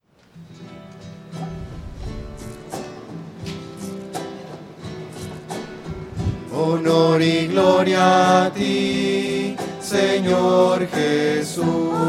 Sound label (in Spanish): voces; misa